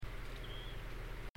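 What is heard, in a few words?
mystery call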